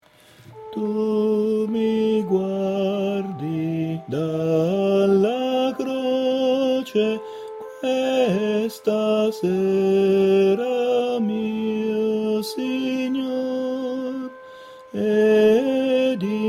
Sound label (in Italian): Tu mi guadi Ten, Coro